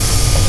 open hat; 音MAD; 街角魔族

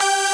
synth

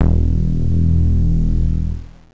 bass; Angelic Layer

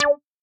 synth

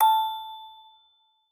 musicbox

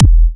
Kick; WAProd Free Kick Samples 2018; WA Kick Pack 3

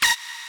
lead

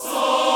choir

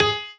piano melo

